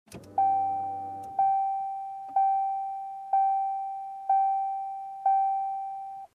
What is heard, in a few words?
Car Door Open Beeping